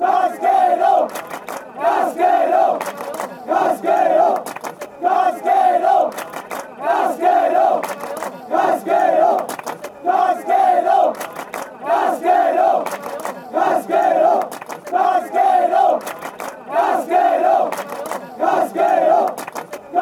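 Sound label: cascero, football chants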